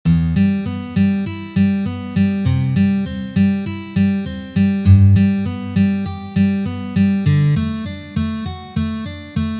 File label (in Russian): Basovye struny dlya akkordov pri perebore perebor